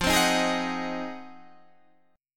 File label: F Minor 7th Flat 9th